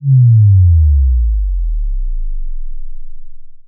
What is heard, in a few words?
Bass Power Down